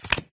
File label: Card Flip